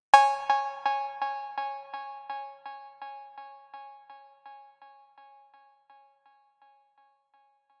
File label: Rim 808 Delay